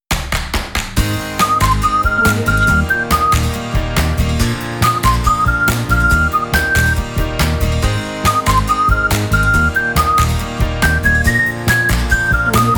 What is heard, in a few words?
positive happy whistle